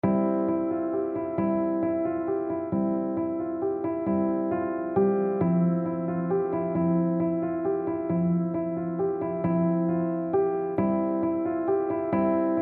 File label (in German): nur Klavier